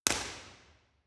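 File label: impulse response, Curtains, XY stereo; room survey; Messiah College; Parmer; Omni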